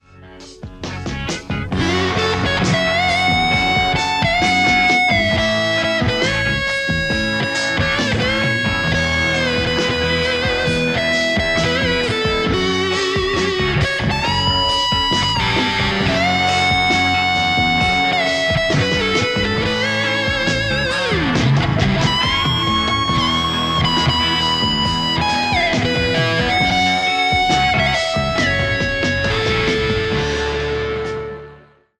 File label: lespaul; mercuriall harlequin v1 3